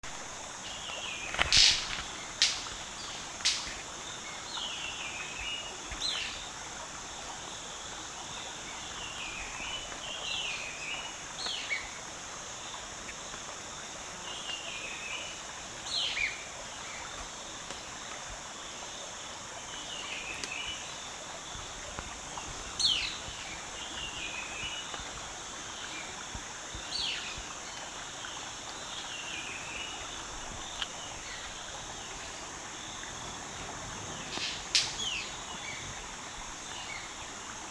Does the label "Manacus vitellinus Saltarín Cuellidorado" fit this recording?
Yes